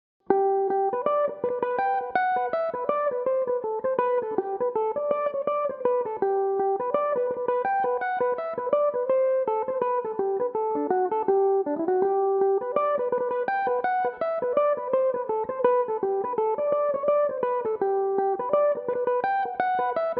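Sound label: G major, irish mandolin, tunes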